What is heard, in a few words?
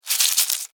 Insect Move Wet, Insect, Movement, Wet, Bug; Rip; Animals:Insects